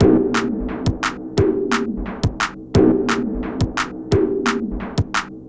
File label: 175 Gm Synth Drum